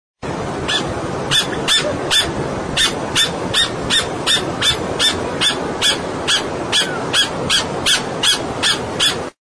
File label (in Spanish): voz hornero